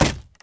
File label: Impact Wood; Impacts; Platoon